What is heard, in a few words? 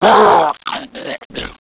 zombie die